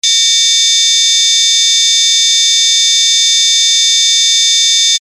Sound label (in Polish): Ton